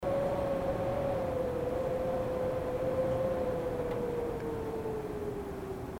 zvuk vetra

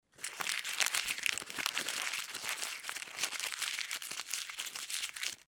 zvuk shelest bumagi

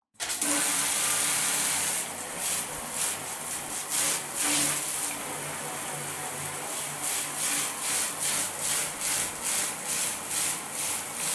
zvuk dusha

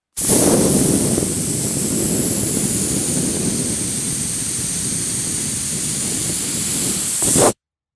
zvuk sduvajushhegosja sharika

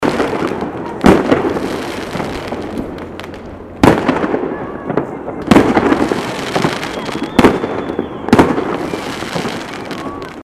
zvuk fejerverka

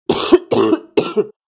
zvuk kashlja

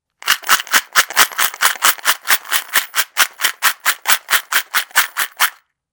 zvuk melochi

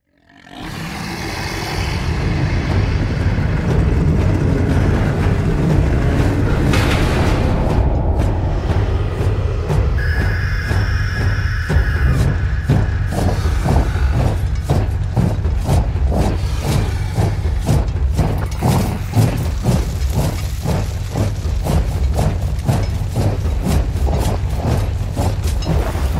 zvuki orkov

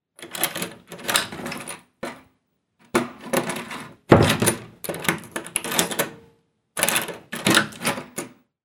zvuk otkryvanija dveri

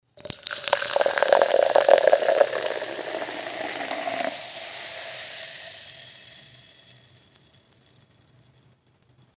zvuk gazirovki

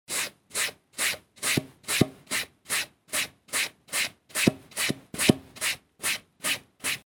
zvuk gubki

